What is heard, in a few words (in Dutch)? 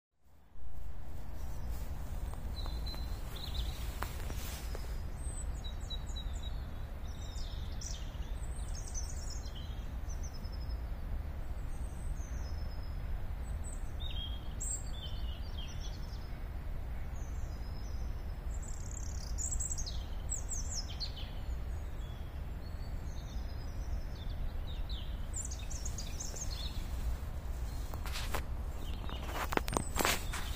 vogels lente